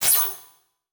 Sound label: Transition Hit Impact Electrical; Production Element